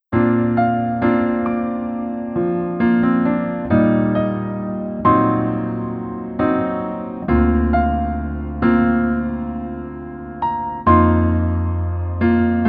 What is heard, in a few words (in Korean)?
Piano ver 멜로디MR; 이태원 클라쓰